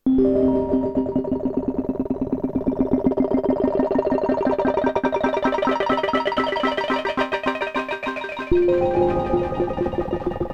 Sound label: behringer ubxa env2 lfo speed